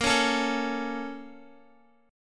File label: A sharp Minor Major 7th, down, Guitar; A#mM7 strummed